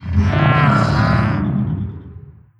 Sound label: dry, strider idle; creatures; combat; vox